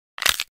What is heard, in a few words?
Hueso roto Bone Break Sound Effect; Hueso roto Quebrantahuesos Efecto de Sonido